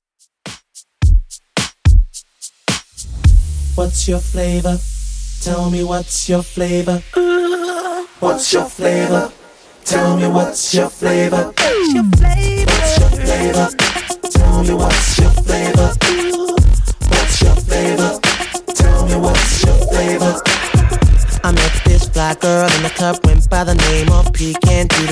2ks DJ Friendly Redrum 108bpm

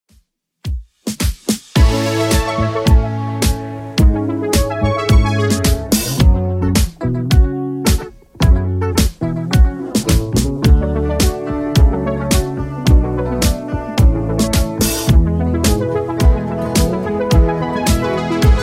Top40 Redrum 108bpm

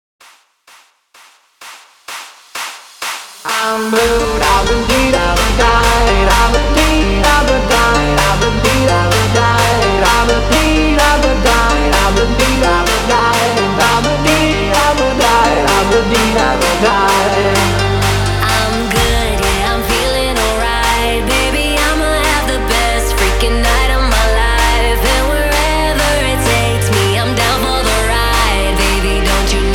CLAPAPELLA HYPE 128 BPM

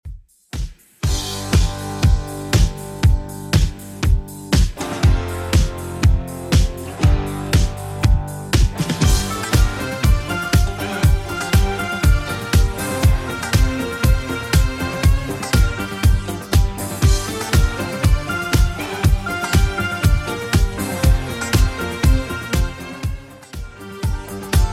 70s 120bpm; Redrum

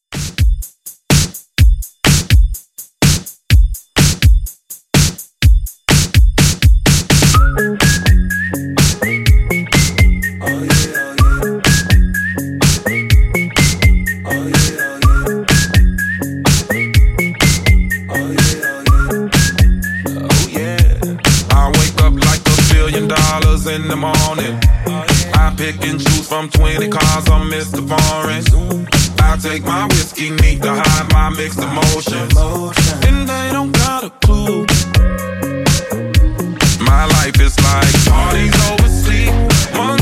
Pop Re Drum 125 bpm

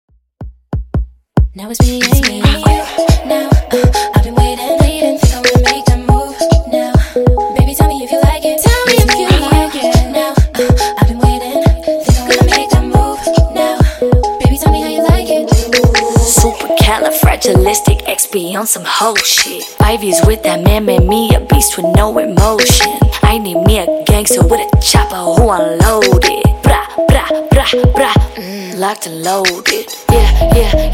Hype Dj Intro Outro 140

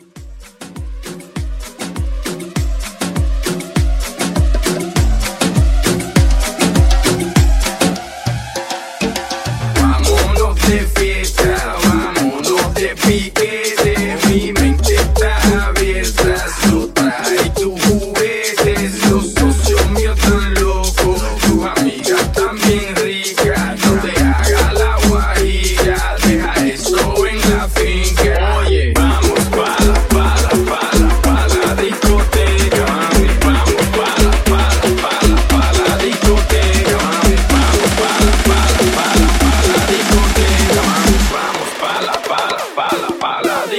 Transition, 100 126; Transition Edit

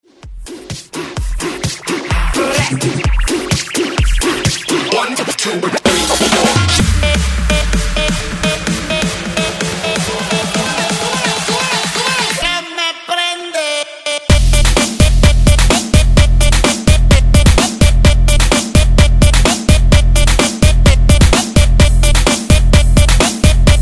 128 BREAKMIX SMASHER; DJDOLLS

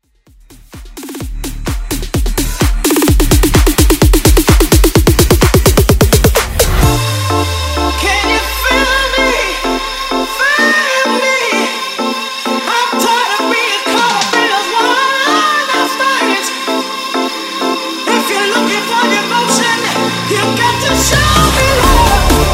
Bassline

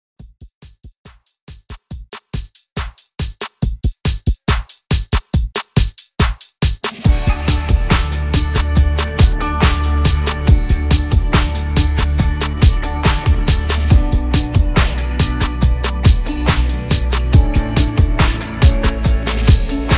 redrum 70 bpm

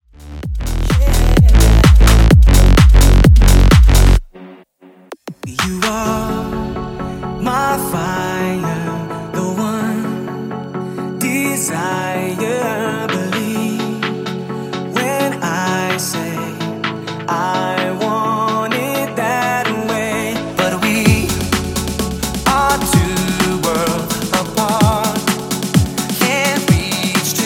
128bpm; Clubing Edition

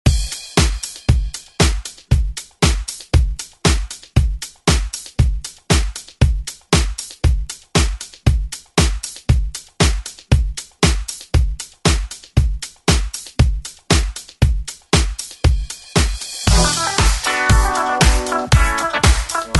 80s ReDrum; DJ Mhark

